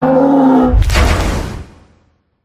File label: death; zarlog priziv